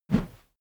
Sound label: whoosh heavy low